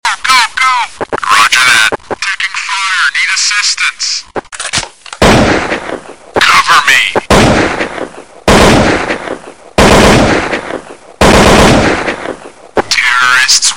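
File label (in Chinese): 经典 ak47; cs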